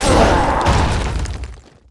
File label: death; Urke Elemenal Knight